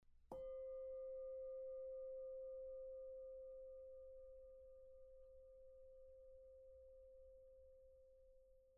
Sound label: medium soft, single bell